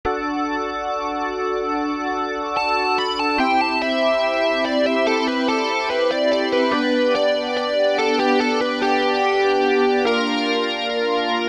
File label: solo